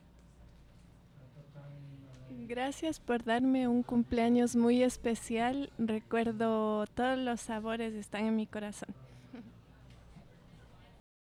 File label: Audience Recording; a-meal-premiere